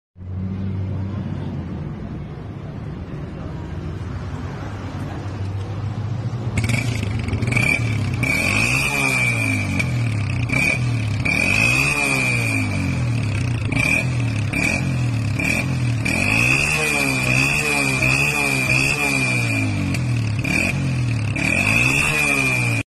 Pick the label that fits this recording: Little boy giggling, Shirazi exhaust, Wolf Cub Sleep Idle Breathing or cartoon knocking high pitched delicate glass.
Shirazi exhaust